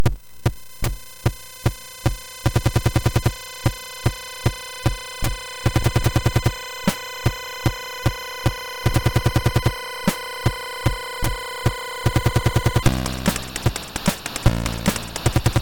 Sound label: Solo, MOS6581R4